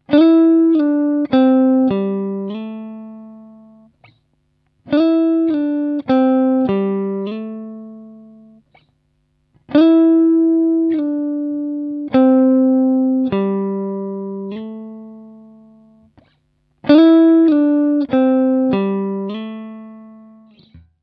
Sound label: Sliding Lick, guitar